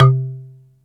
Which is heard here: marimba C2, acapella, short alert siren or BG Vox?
marimba C2